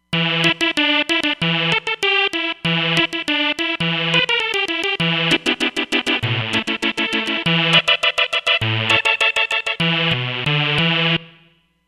Synth Lead

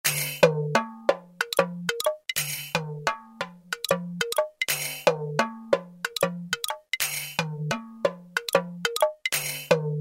7-4 loopy, Kurzweil K2000; LOOPS; Kurzweil K2000 Contemporary ROM; Contemporary ROM Programs and Demos diskette

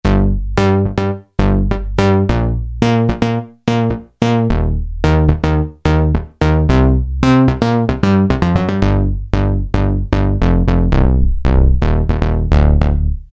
Bass; Cheetah MS6

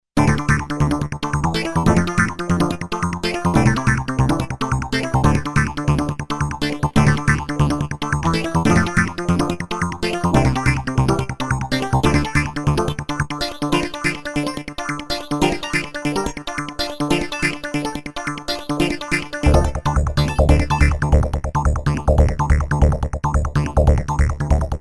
Arp Twins

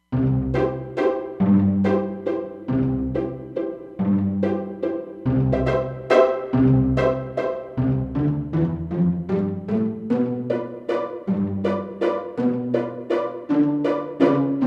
Delicate Pizz

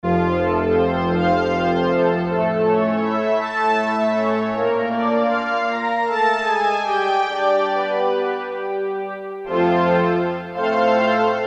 Legato, Woodwind & Strng

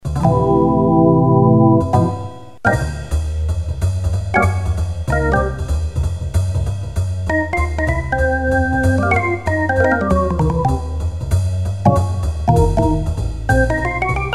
Organ

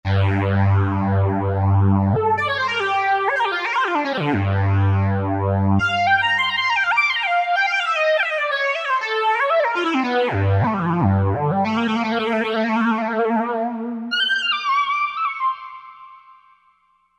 Mono Leads; Roland SRX-03 Studio SRX

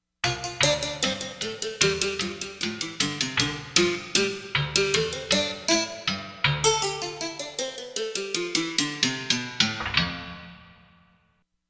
Metal Biwa; Korg MSC-13S Ethnic 2

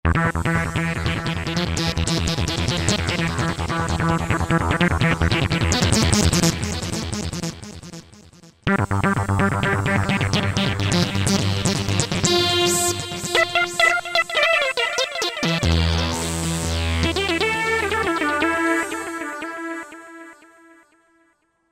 Roland JV-2080; B'on d'moov!

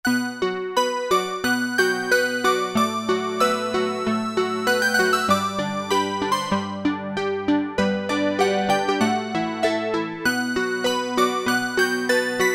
Pizzicato Sound, Roland Juno-60; Factory presets

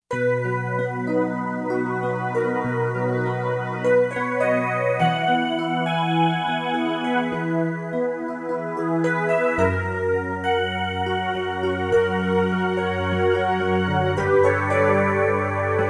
Heaven EP; Roland SC-880; Factory Patches

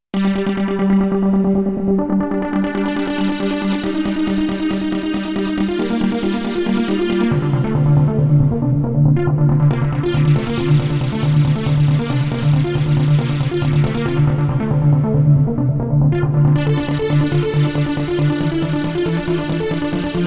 Euphoric Trancer

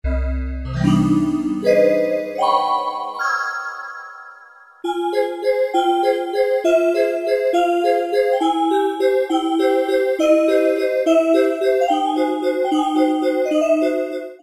Glass, Yamaha TG33; Factory Presets; Preset bank 1

